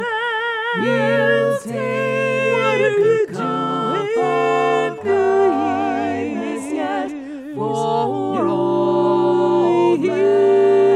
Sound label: SATB